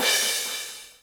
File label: Cymbals crash; Vengeance Samples